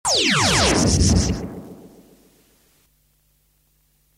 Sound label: Stinger